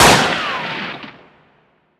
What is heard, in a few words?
tornado; Wild Guns